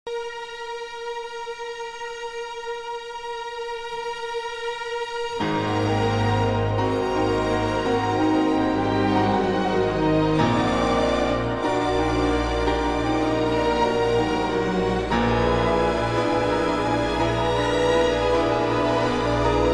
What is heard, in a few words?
piano concerto; salonen; movement ii